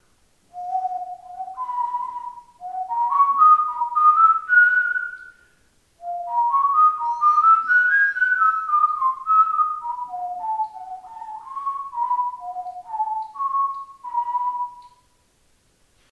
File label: Bathroom whistling